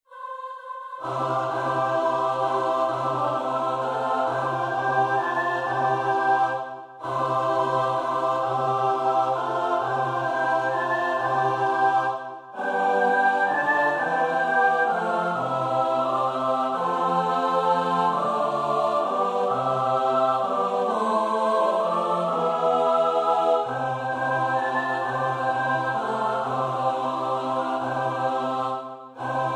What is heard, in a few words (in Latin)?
In dulci jubilo vers 4 (S-A-T-B); Praetorius (Michael)